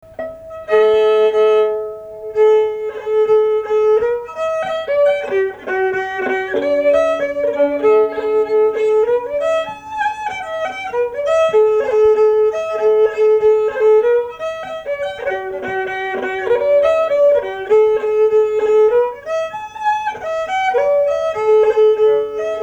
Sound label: march a moll